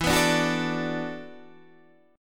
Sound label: E 9th Suspended 4th E down Guitar Open D